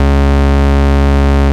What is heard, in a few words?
Bass C#1, Glitch Toys & LoFi; Ableton Foundation FreePacks